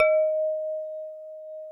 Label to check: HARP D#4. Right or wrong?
Right